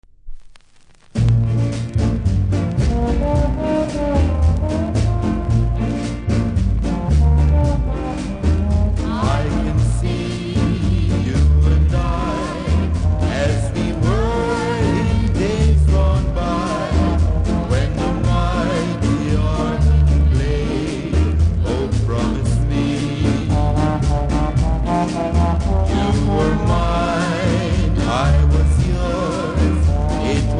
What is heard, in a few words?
MIGHTY ORGAN